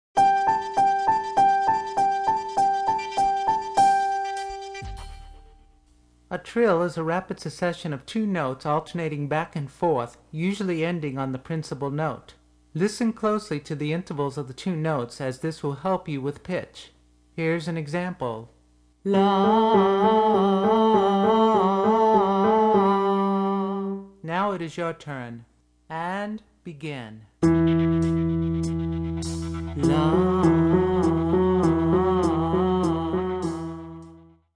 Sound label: Vocal Warm-up For The Modern Singer